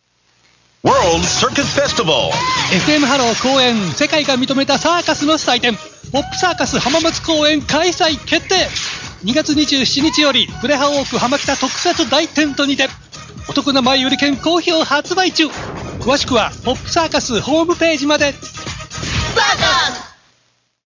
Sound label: pop circus